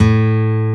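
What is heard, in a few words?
acoustic guitar A1; Proteus1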